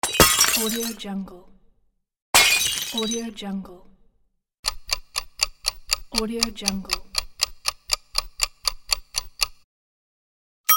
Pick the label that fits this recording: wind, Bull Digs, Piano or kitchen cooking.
kitchen cooking